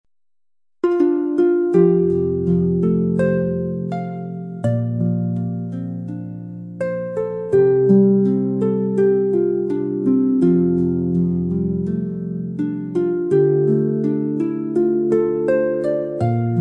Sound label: Relax Menu